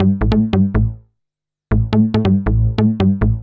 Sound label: SNTH BASS ELCTR 140 A, Techno